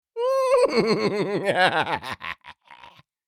Sinister laughter little fantasy creature; fantasy friends

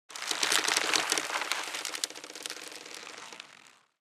Military rope tension; Military Equipment

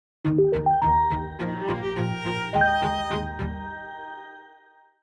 Energetic modern logo intro

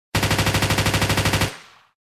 Russian PKM machine gun burst close perspective

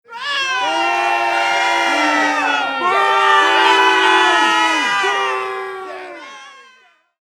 Sitcom crowd disapproval boo

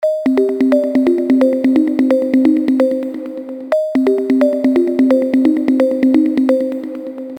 Infographic call ringing tone loop

